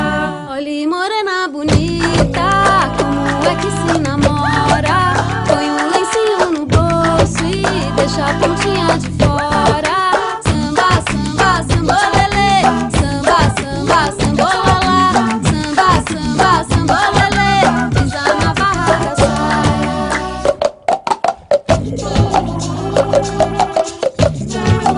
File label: Samba